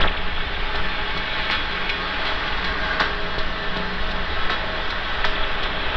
INDUSTRIAL MACHINA LOOP, DEEP ATMOSPHERICS; Sonic Foundry (Sony Creative Software); Vir Unis Infusion

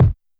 BD Break; YAMAHA RM1X

